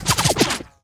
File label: damage vehicle; World Of Warships